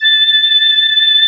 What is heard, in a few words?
SYNTHY F